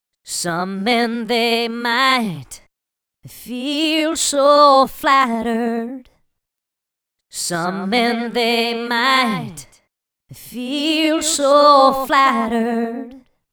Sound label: echo slap back; psp